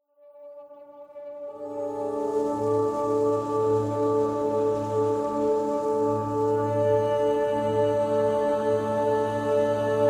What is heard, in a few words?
OCEANA